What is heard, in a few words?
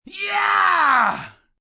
Cheer Yeah, Cheering, male, big